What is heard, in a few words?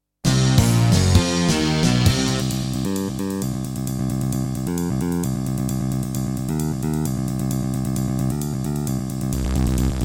Terratec MiniWaveSystem WT64; sigil doom episode 5